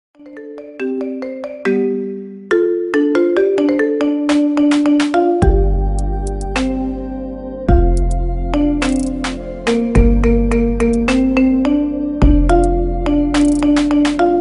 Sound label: marimba ringtone remix; Hip Hop